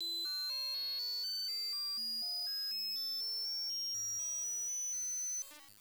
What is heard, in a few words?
soaring; pxt-calliope